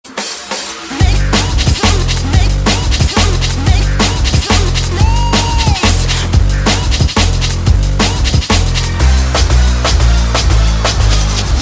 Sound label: win music